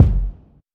low tone steel kick drum sample F sharp key, Royality free kick sample tuned to the F# note